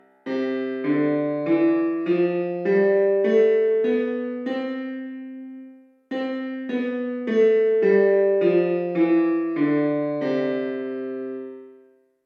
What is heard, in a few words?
piano Pla Open; 漆喰空間における音響効果